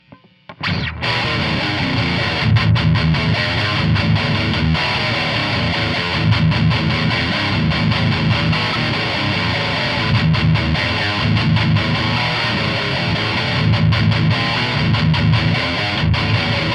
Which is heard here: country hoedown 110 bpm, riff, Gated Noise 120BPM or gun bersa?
riff